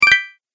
Bonus Collect, video game sound effects

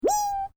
Created Creature Small Call, Alien Sound Effects

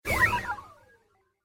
Fast Droid Flyby

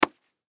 Lacrosse Impact, Lacrosse Impact Forearm Pad Hit; Lacrosse (Sound Effects:Sports)

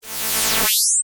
Ray Gun, Scifi; Science, Fiction; Battle (Sound Effects:Science Fiction); BlastWave

